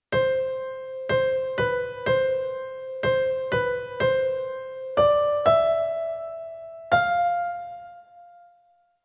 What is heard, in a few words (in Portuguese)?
polifonia